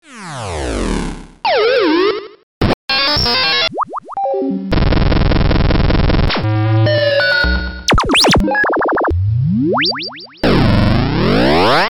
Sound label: Sound Effects Generator, chipmusic